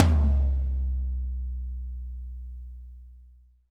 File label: TOM, WARM+FAT KIT; Spectrasonics - Burning Grooves CD2